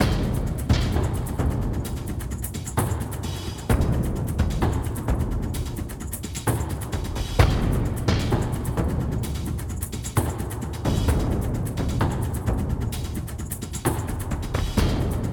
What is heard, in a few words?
Mus Action Perc High